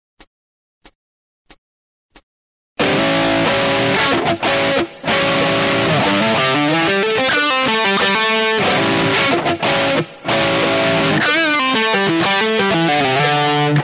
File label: blues slomo